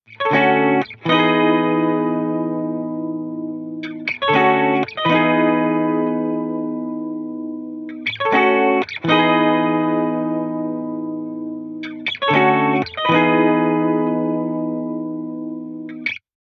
guitar loop 120 E; Pop; Guitar Stacks; SD PASADENA Pop Sample Pack; Slate

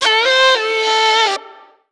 phrase F, Vocal; Slate Digital Omnivox Vocal Sample Pack

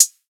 hat, drum sample; Hats

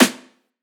snare oneshot, Pop Sample Pack; Slate; SD PASADENA Pop Sample Pack

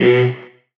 vocal B; Slate; AURORA Sample Pack; Vocals

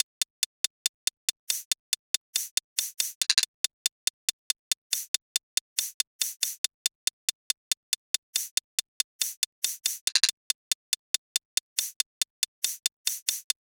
hat loop 70, Drums; Pop; Hat Loops; Slate; SD PASADENA Pop Sample Pack

